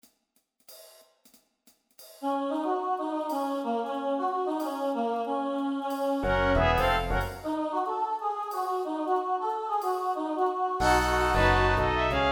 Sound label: MIDI